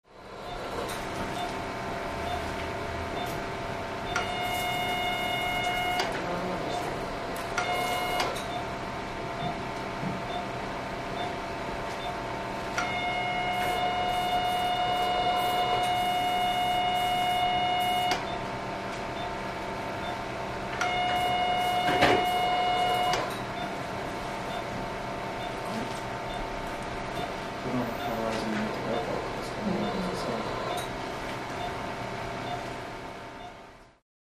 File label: Operating Room Hosp, hospital